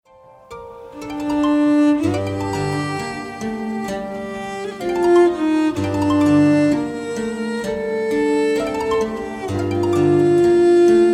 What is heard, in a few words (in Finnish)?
vibrato virsi